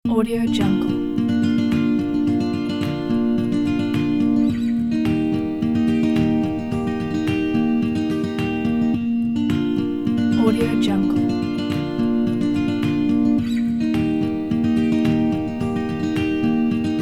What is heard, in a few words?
piano folk acoustic; music waves production